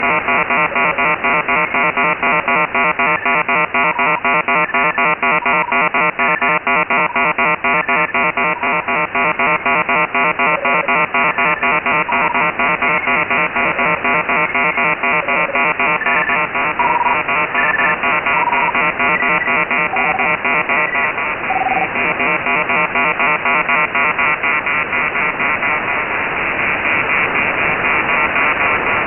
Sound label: Beep 13605kHz; evening; Cuban Rum Runner